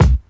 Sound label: old school hip hop steel kick drum b key